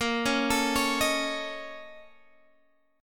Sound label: A sharp Minor Major 11th, A sharp, down, Guitar, Half Step Down